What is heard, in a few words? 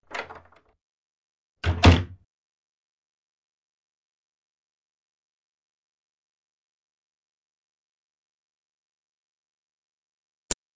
Track, Doors; 헐리웃특수효과음; 문 소리